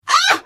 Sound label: Hit; The Elder Scrolls III - Morrowind